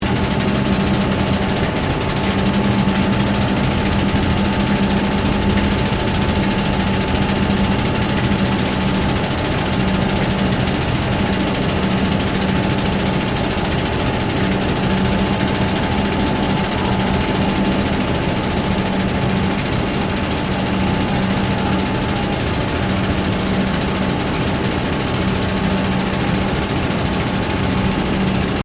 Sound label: Train Passenger Xtrapolis 100 Faulty Mechanics Loop; Melbourne; Surburban train with faulty equipment; Train Melbourne Passenger Xtrapolis 100 Faulty Mechanics 01 Loop